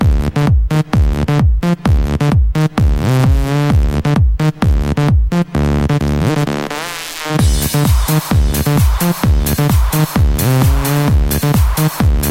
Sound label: Wake-up Beat